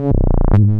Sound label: Synth Note; One Shots; Instrument